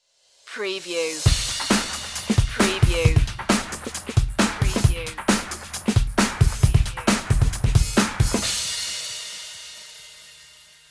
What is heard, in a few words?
burn out stinger